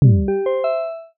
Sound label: ui quest pin accept